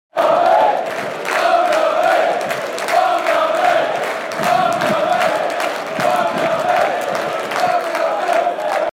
Barmy army barmy army

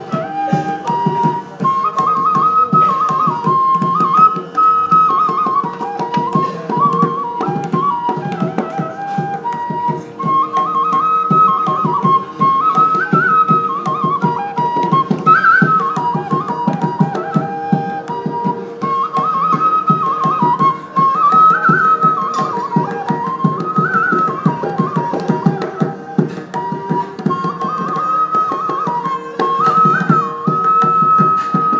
Indian music in Fort Cochin Kerala

